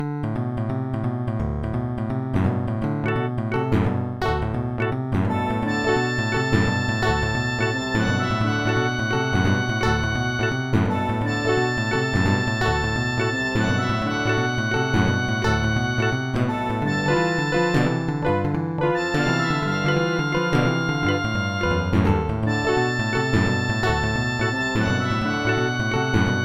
modem song; The Music Studio

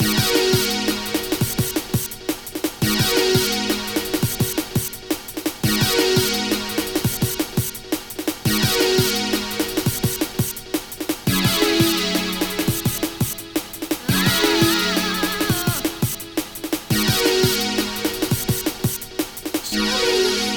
drum and space; Trackers; Modland

